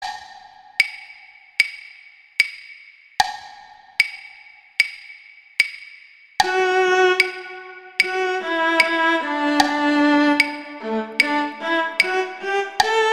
Duet Cello II; Duets; Mozart

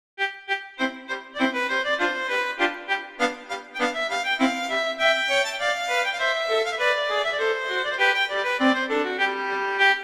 Rondo, Allegretto; Mazas; Duets; Duet 11

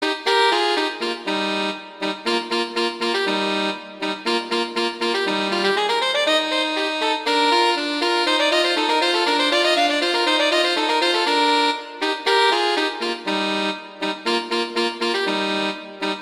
Duet; Mozart; Duets

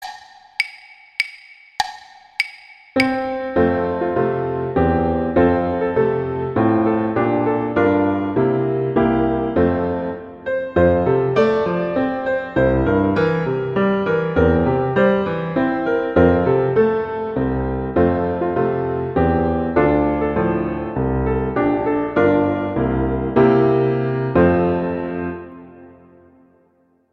O Christmas Tree acc

